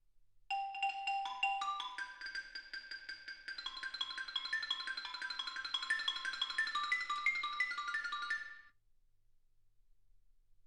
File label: xylophone